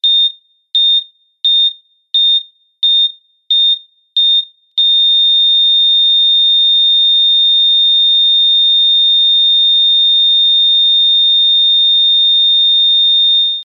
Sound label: heart rate monitor